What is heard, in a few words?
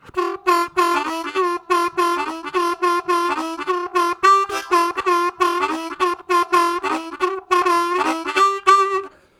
Uncertain, Harmonica, Riffs; Zero G